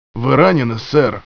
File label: hurt